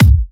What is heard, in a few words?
Bassdrum